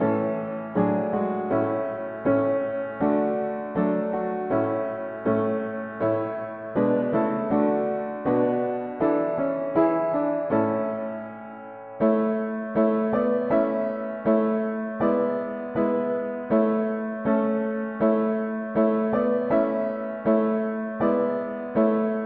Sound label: mono-piano, hymns; Twas Thy love O God